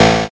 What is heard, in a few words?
zap